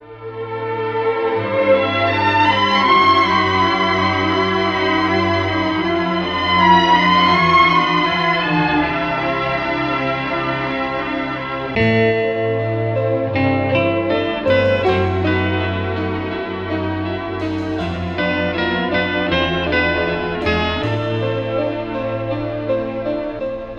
strings all the sads; Loops

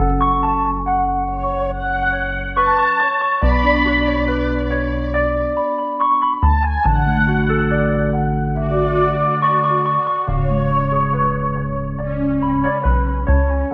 keys, jazz loops

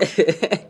laugh

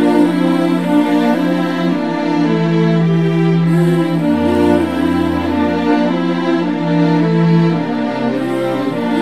strings, Sad

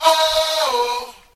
"oh oh" male SUNG; ooh ahh

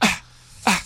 "ah ah" male

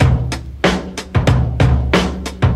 washing machine, drum loops, boombap

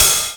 Hats Electro Open

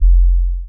zippa, Heartbeats; Kicks

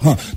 huh male, Voice

